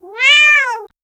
slime cat meow; cats

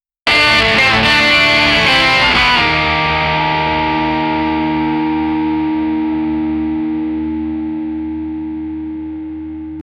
sharp electric guitar hit

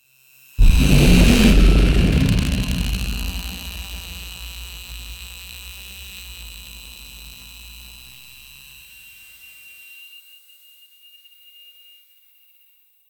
featuring a high pitched, charge up

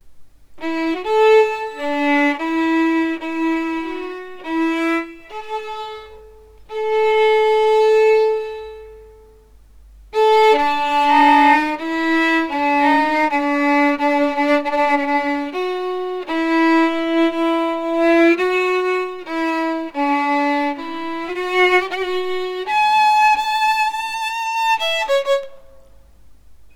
buzzy sound on a violin

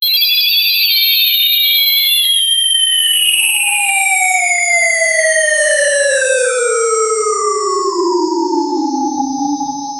claiming booth effect, ambiance

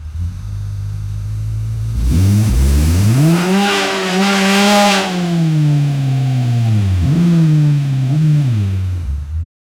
ultra realistic formula 1, race car

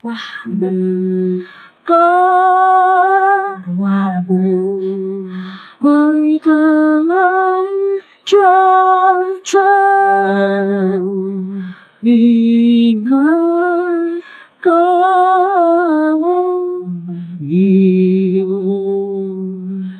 african ancient ritual, ceremonial

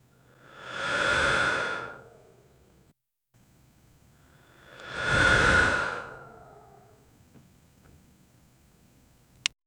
violent puff of black smo, ninja

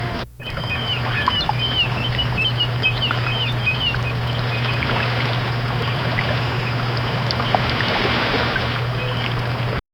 gentle harbor waves lap

